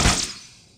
bullet needler; minecraft

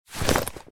open bag; minecraft

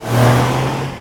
driving; minecraft

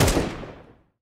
bullet ak47; minecraft

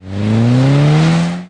driving; minecraft

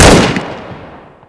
bullet medium; minecraft

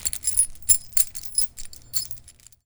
chain small; minecraft

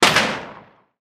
bullet mauser; minecraft

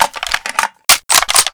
reload m16; minecraft

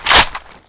reload bolt; minecraft

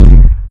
shockwave; minecraft

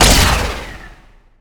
bullet lazer; minecraft

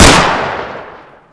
bullet auto; minecraft

